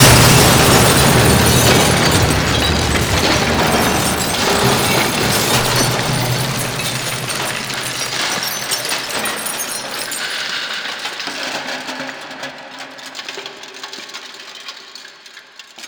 crash

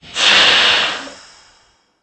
ambience air release

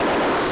door

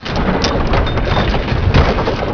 tower roll; de hl stronghold

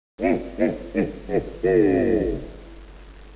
mech ho ho ho loop

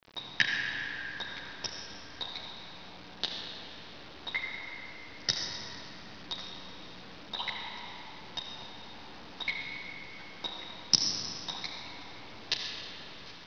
Base Drips, ambience

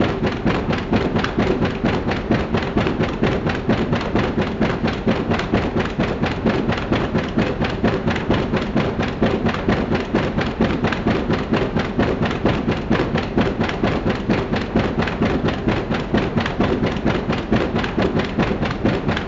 ambience industrial

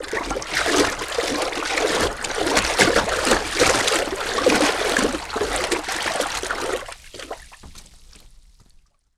water move

